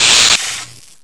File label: spark; XDM; buttons